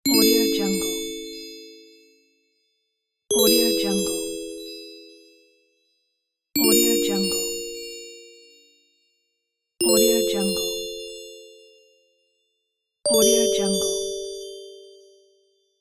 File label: magical notification